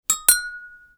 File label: UI Quirky